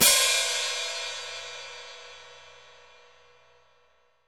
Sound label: crash cymbal one shot a key, crash cymbal sound clip tuned to the A note; cymbal drum samples